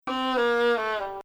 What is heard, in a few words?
Bayati Rast low, Maqam Bayati; Composer: Ibrahim il-3ariyan; Rast 4 low